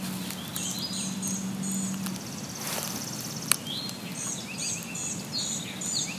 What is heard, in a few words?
Buzzy bird